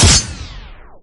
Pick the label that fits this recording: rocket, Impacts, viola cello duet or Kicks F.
Impacts